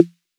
Snare, Roland TR-808; Roland MV8800; TR-808mk2

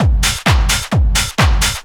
techno, DRUMLOOPS

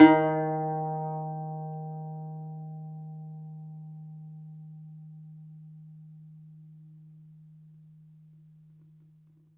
DOMRA, Segundo Domra, Plucked Strings

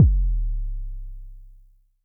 K 808 ish, Vermona Mono15 Drums Kicks

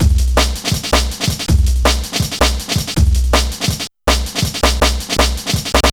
162, breaks; M8

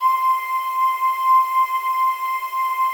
PAD, DIGI-PADS

